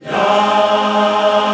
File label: Ya, African Aahs, group; Roland LCDP11 Africa VOL-1